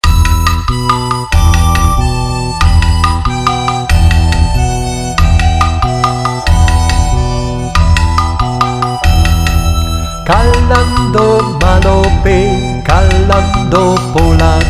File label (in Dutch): Lied begeleiding zang